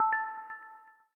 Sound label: autumn unlock, ui; Samsung; Galaxy A5 2014